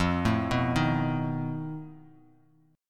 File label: F 7th Flat 5th F Bass Standard; F7b5 arpeggiated; 13,12,9,8